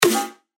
Snare; Generation; revealed claps snares vol 2

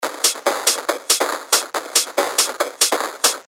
techno loop 140 BPM; Fracture; Revealed Techno Top Loops Vol. 9

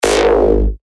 G#, bass shots; Seeker; revealed bass shots vol 3

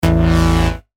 BSHOT A; Pang; revealed bass shots vol 4

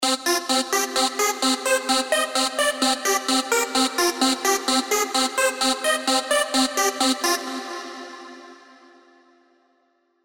LD Clarity Surge; revealed serum 2 rave asylum

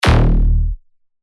Bass Shots D#; Whip; revealed bass shots vol 4

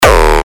Kick D#; Sizzler; revealed magma kicks

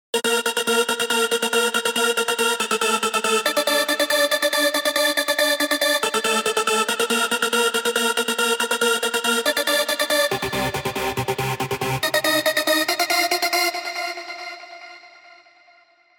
Trance Gate; Spire; revealed spire mainstage drops

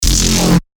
BSHOT F; Metropolitan; revealed bass shots vol 3

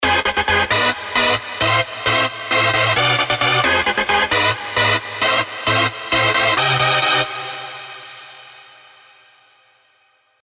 rave stabs; 1nsulated; revealed spire rave stabs

